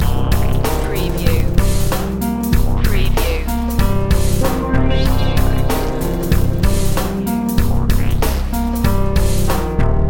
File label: underground heavy